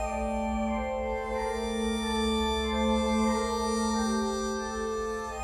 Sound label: Kelele, Kelele Drones; World Percussion